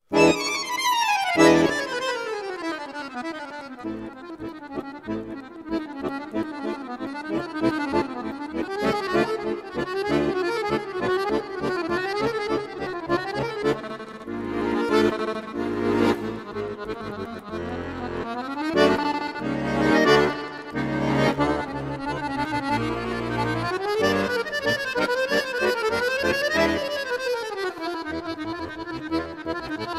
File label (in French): vol du bourdon